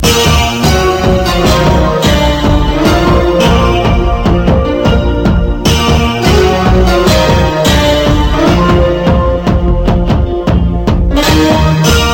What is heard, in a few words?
Rington Hindi; Sad